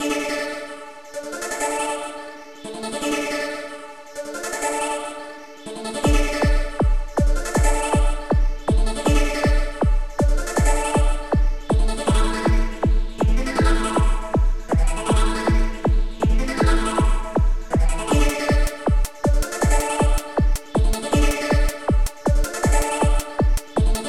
Breakz n' Beatz

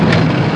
repair

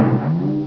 Cannon; Widget Workshop; A Mad Scientist's Laboratory (1996); Maxis; Phsics Cannon (tymp up11)

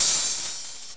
Hi-Hat Open; GMPlay; Drums; TG300B

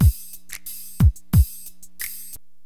Drum loop, HipHop; Drums; LiveAct Pool

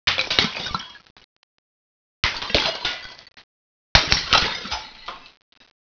broken

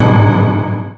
GOLD PKUP; FORSAKEN

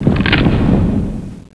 island Fall; NETSTORM

